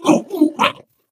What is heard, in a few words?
ballbot hot laser